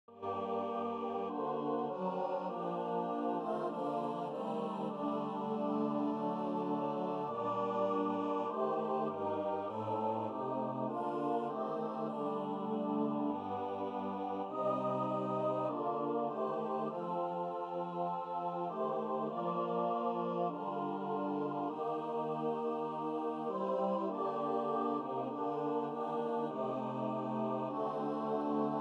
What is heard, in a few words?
choir